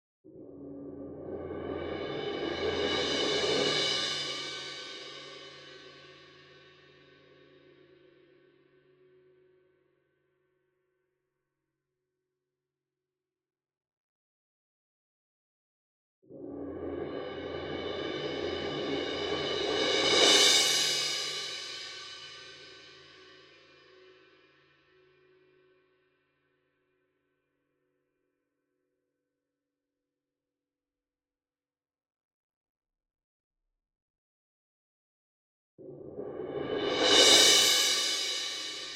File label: CYMBAL Crash Swell Soft Beater Medium; boom library; Cinematic Strikes Construction Kit